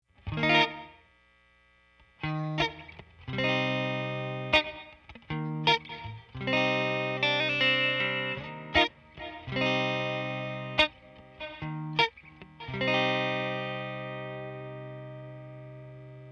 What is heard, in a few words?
Strymon Big Sky pre delay API chords